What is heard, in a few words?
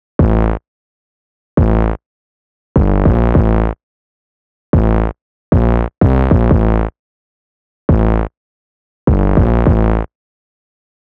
152 silly bass isolated